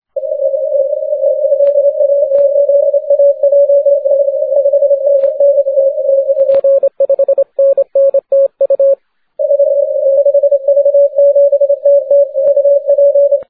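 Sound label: 40m continuous wave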